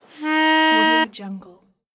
harmonica accent

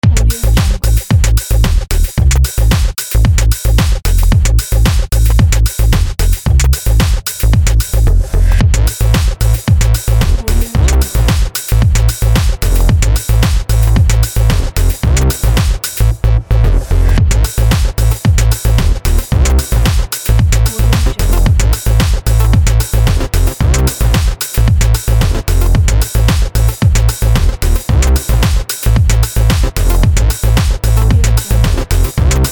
electro house groove; pashastriker

